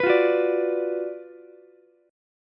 Gb-Major 7th Suspended 4th Sharp 5th-Gb-16,17,14,17-1-down-Ukulele; GbM7sus4#5 strummed; JGuitar